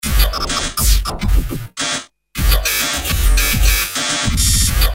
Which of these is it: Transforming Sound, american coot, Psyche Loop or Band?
Psyche Loop